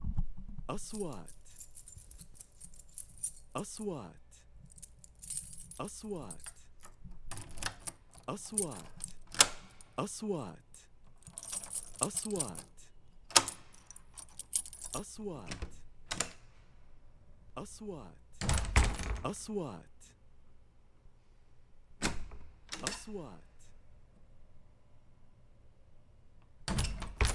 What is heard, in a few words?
keys door open door